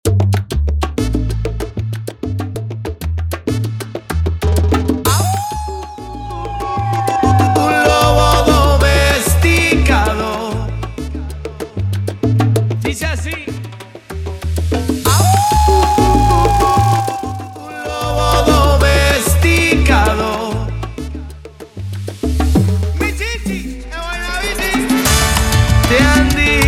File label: Intro Break Outro 96BPM